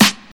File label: studio steel snare drum a key, Royality free snare tuned to the A note; snare-drum-samples